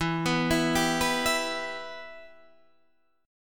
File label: E-Minor